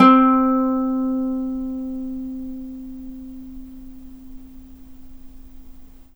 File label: NYLON GTR